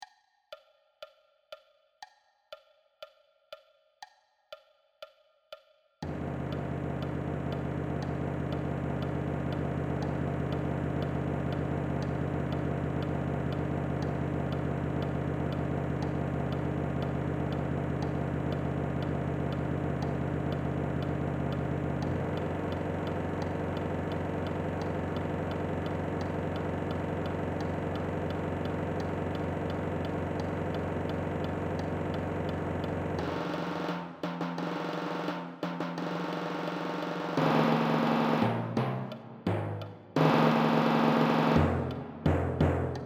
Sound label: Timpani